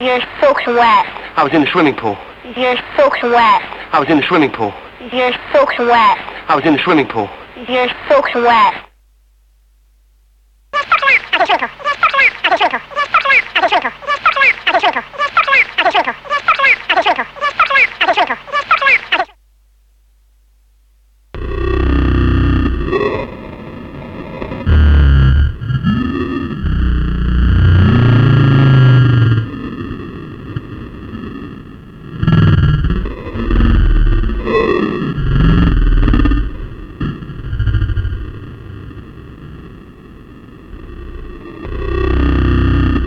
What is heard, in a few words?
VSS pitch examples; bendexamples